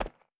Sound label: boar footstep concrete hard; Rust